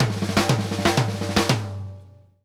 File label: OFF THE SN, SP FILLS 120, Drumworks; Sampleheads - New York City Drumworks VOL-1